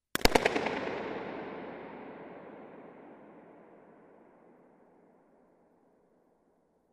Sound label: balloon burst in centre of dome with mic at focus; Trevor Cox; SoundBook